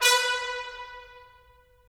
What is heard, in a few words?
BRS, Tps Stacc; Roland - LA Composer vol. 4